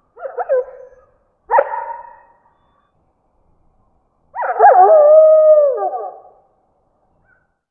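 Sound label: animal wolf distant; Call of Duty Modern Warfare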